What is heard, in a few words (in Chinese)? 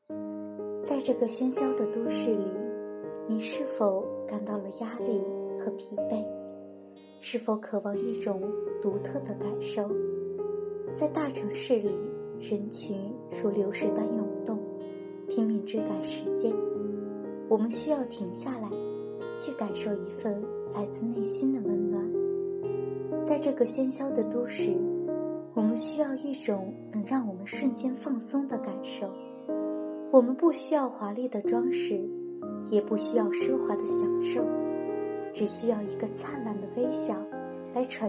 bgm版, noise